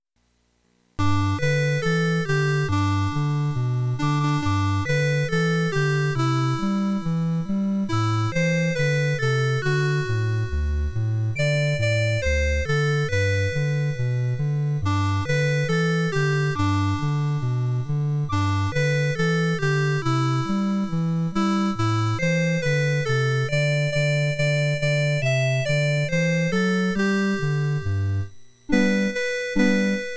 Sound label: sid Jingle Bells